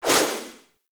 Guardian Shell Impact; Warframe; Focus; Vazarin

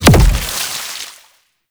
Gouache Impact D; Warframe; Follie

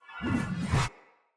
Great Sword Pre Whoosh; Weapons; Swords; Dendrit Ara Great Sword; Accents

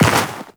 Walk Snow; Warframe; Characters; Tenno

